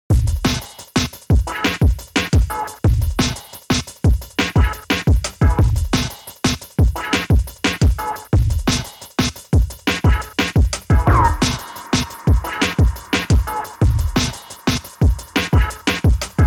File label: Drum n Bass; u-he